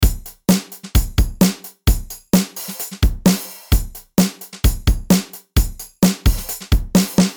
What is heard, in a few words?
Rock Metal 130BPM